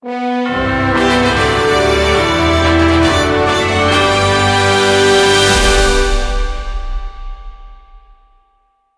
free Spin Gold; football

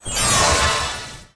random symbol transform; fairyred

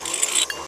win Loop; eggomatic

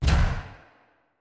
highlight Casino; finn

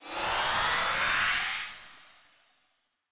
respin out; codex of fortune

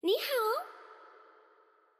Princess wink; koi princess

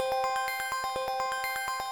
count up loop; joker pro

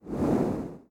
reel is zoomed in; koi princess

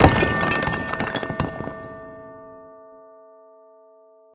bonus game intro; secret of the stones

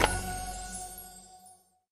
spin button click; fireflies

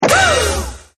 betline Win Sound; jackpot 6000

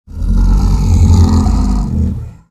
kraken Shows; oceans treasure

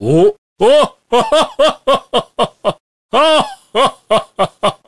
Big Win Mega Start; santa; jingle spin

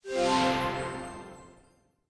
small Win; jacks or better multiple hand

